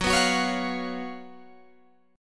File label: F sharp Major 7th Suspended 4th, Guitar, Open G